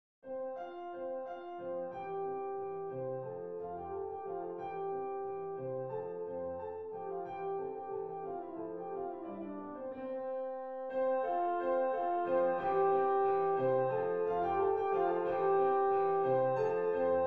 gavotte majeur; corelli arcangelo

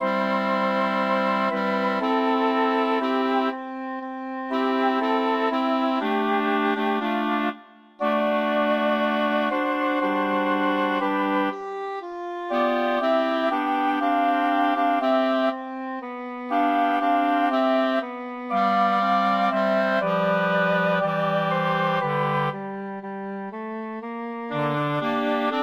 pour quatuor a vent; bruckner joseph anton; locus iste

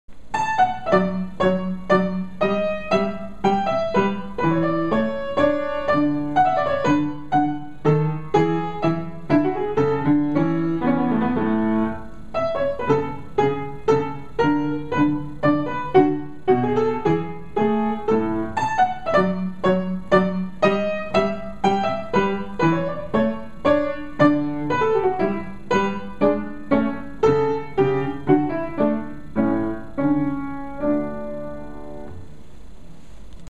menuet

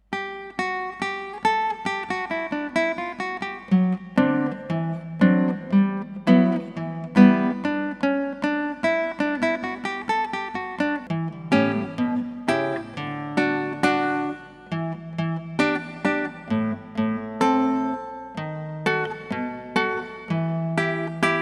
guitare; fission musicale

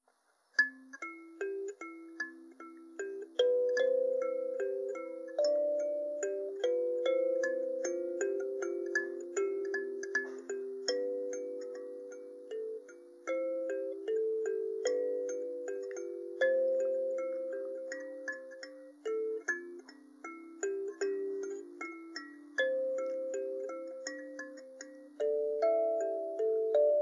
pour kalimba; ditation massaa

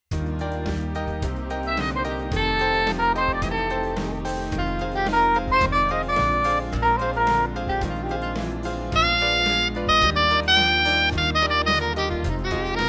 ambiance jazzy

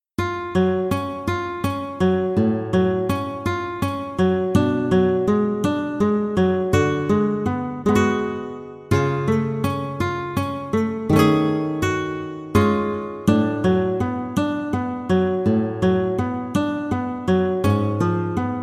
etude harmonie F

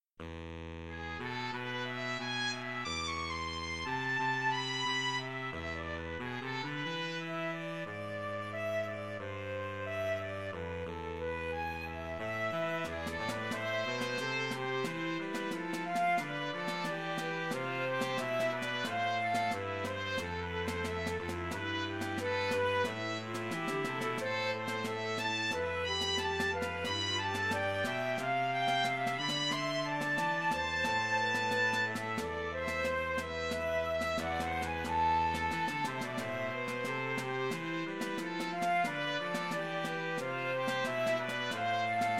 antienne; association musicale salinoise; ecole musique

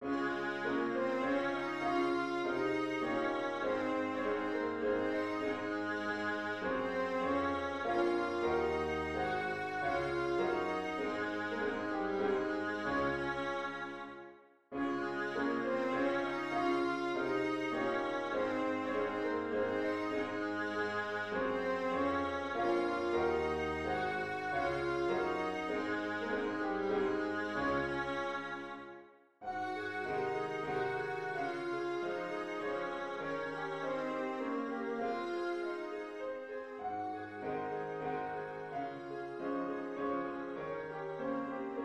valse ra majeur; beethoven ludwig van

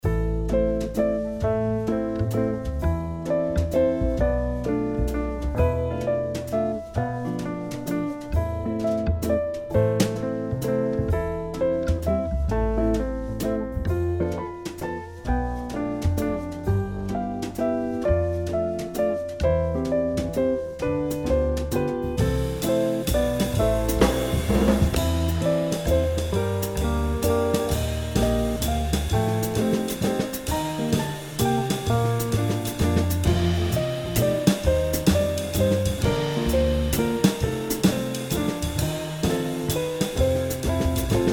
valse

